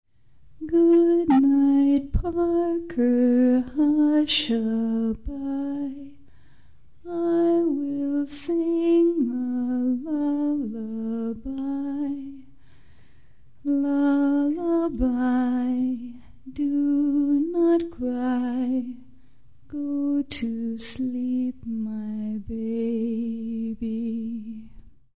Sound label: lullabye